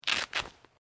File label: JACKET SKI SLEEVES VELCRO SHORT, Movemement sounds for various articles of clothing; Skiing And Snowboarding Sound Effects